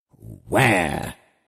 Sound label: Snake